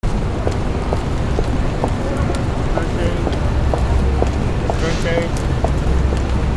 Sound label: FOOTFALL